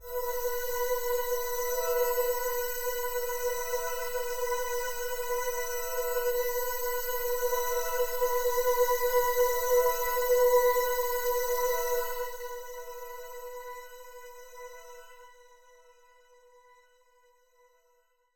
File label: One Shot Drone B, dystopian drone; One-Shot Drones